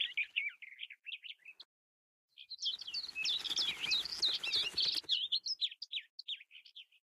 ambient birdsong no danger; run-dorothy

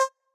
time running out; Ben 10 - Spot The Difference

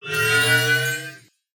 powerup; Nickelodeon - Capture The Slime

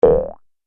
arrow pop; Looney Tunes - Avalanche Snowboarding

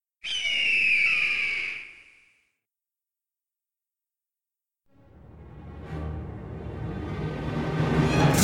canyon music intro; The Adventures of Puss In Boots - Barrel Run; NextlevelGames

